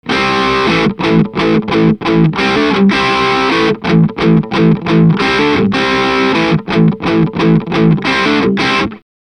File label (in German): Boss DS 1w Standard Tone 11 Uhr Dist 3 Uhr